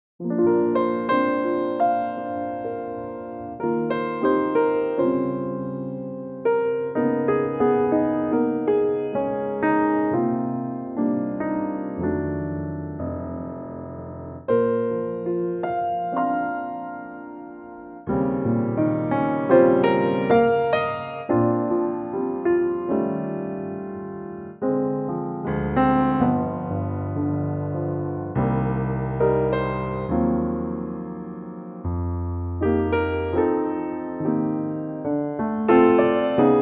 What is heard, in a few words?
piano Bb; key - Bb - vocal range - A to C (optional D); Beautiful piano only arrangement